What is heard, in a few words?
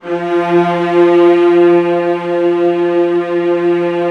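VIOLAS G3, Strings; ILIO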